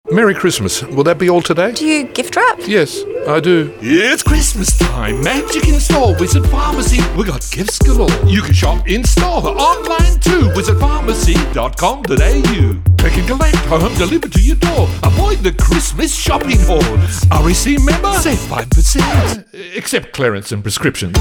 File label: Radio Gift Rap